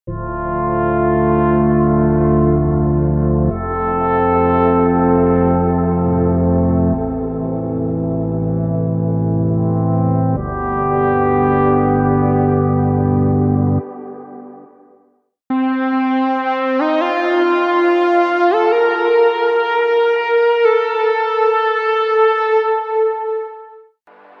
Cinematic; unisynth

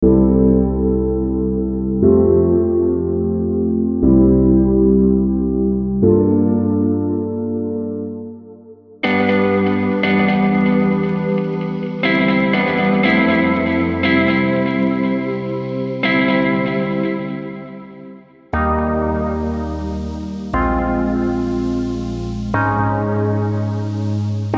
Mixolydian Celtic Chords

